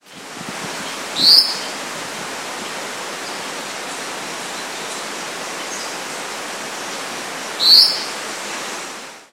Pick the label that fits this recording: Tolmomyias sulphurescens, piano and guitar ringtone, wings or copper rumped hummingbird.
Tolmomyias sulphurescens